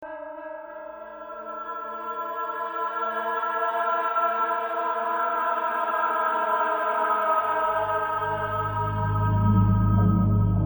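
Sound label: Exfil Tunnel Ambient; F.E.A.R.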